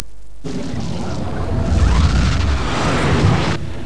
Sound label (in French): fermeture de la porte